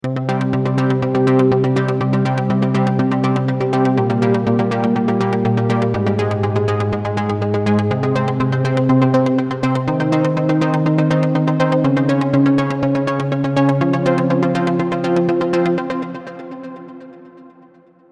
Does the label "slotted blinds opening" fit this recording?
No